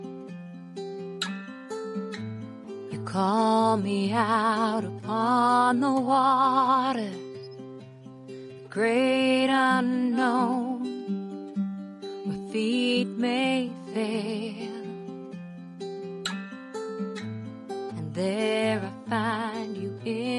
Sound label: singing